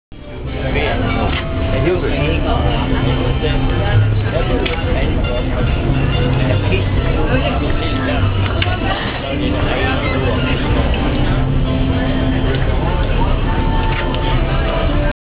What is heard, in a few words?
restaurant; thai cambodia